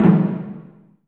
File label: oo daiko, taiko; AKAI S6000 CD-ROM - Volume 5